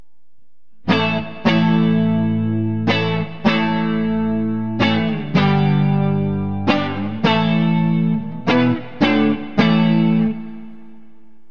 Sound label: neck clean; Burny